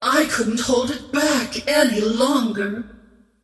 scream whiff; queenofpain

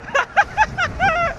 laugh ru; soundboard; ti11

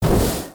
ranged impacts; terrorblade

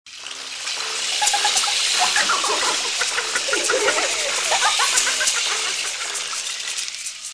armor layer; treant

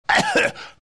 cough; dota; batrider

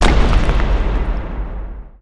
uproar split; weapons; dota; primal beast

